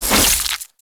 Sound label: Melee Whoosh; Infested; Zealoid Prelate